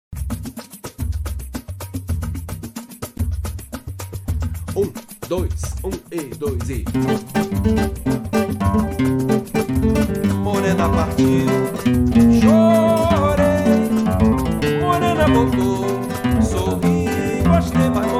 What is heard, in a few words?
110bpm